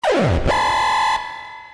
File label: party alarm, zaps and beeps